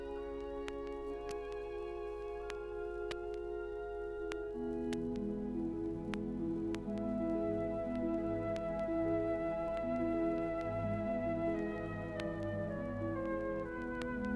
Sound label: Prelude noise